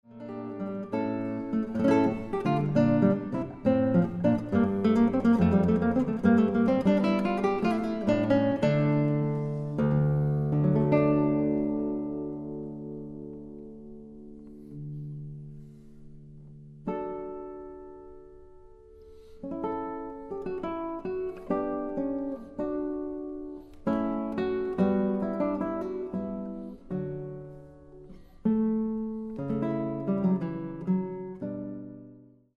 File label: courante, guitar